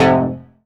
stab nite, stabs

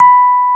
electric pianos; Wizoo Electric Pianos

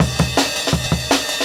164, Jungle Breaks; 90s Sample CDs; Original Jungle Breaks

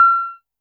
korg trident

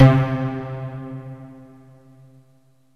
LINEAR SYNTH

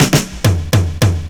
DRUM FILL

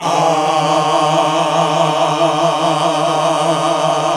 GOSP. AAAHH

